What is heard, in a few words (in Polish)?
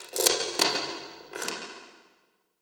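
otwieranie kluczem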